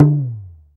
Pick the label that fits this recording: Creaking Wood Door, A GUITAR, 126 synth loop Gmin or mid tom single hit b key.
mid tom single hit b key